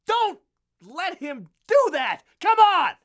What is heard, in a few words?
Cry CHEER; TrueCrimeStreetsOfLA; XBOX